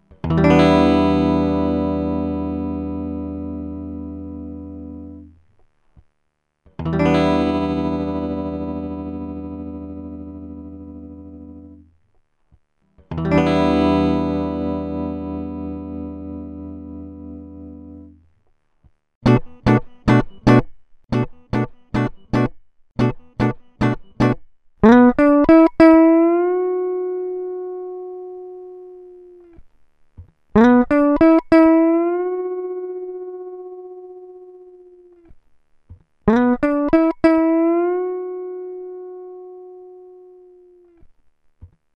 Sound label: gtr